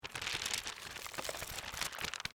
Bible Flip Pages, Bible, Flip, Through, Pages, Book; Foley; BlastWave